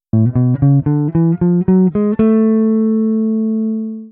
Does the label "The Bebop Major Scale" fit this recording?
Yes